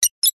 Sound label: science fiction computer beeps high pitched response